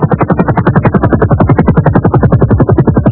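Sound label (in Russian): резкий отчетливый звук крутящихся лопастей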